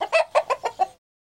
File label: Chicken Cackles; Chicken Cackles Laug; chickens